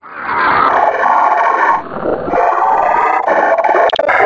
zombine idle; scmod

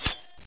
knife swing

